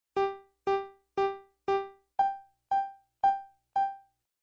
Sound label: Stereo Allignment Tones